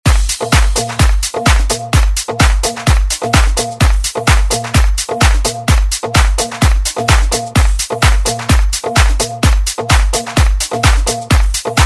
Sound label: house redrum